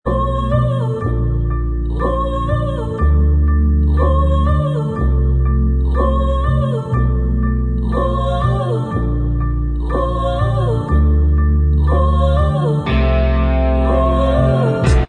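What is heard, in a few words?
backing vocals track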